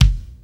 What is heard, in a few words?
808 BD; Crypton - DrumBase5000; DANCE3+TB303